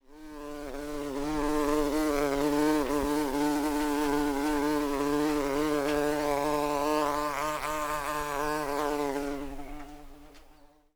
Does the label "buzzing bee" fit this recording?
Yes